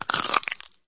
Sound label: force choke sound; lego star wars